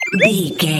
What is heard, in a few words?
collect item sparkle pop